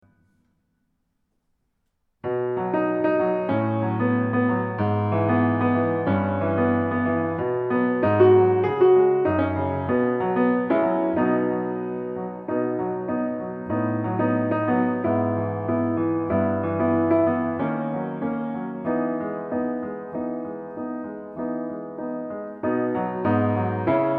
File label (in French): piano solo